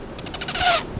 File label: divesound; Waldport